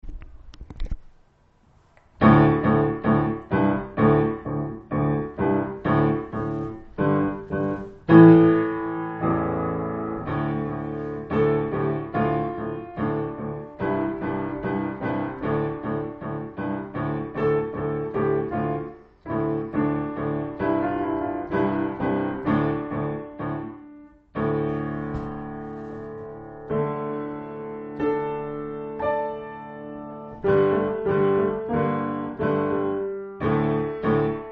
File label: Thundering Drums